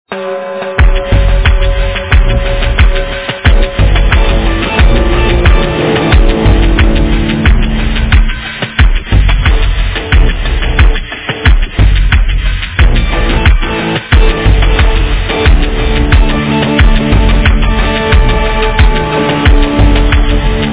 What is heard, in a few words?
computer works